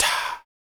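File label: vox percussion, Vocal Planet Jazz FX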